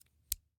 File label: Scissors, snap, snip, shear, small, single; Eiravaein Works; Vaeyan IV